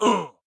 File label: Enemy Orc Dead